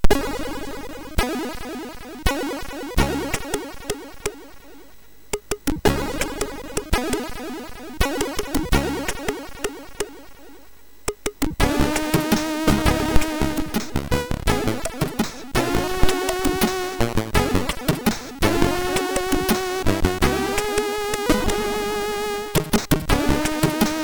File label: Relaxing, MOS6581R3; hvsc